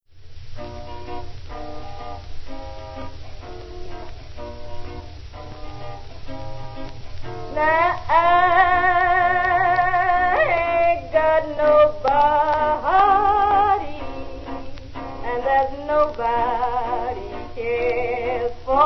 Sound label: remove noise; AUDIO ENGINEERING